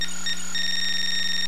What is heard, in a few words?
com bleep